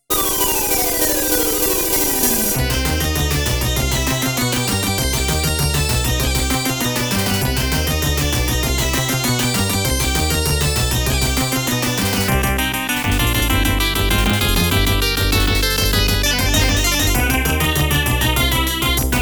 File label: BOSS AREA 5; ETHNIC MAGICIAN